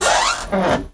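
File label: metal open; Mafie 1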